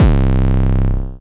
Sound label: C Bass, DnB One Shots